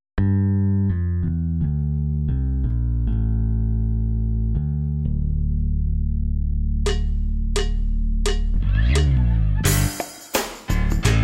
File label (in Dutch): Jongen met stekelhaar